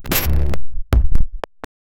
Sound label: dark hacker boot sound, metallic ping